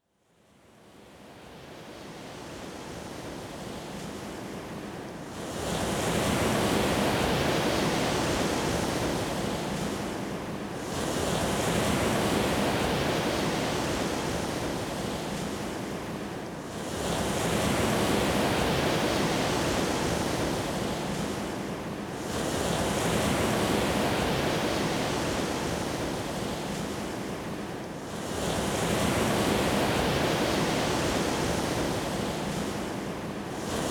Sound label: Ocean Whispers